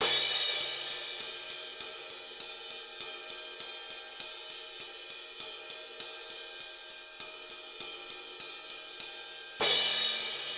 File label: bright cymbals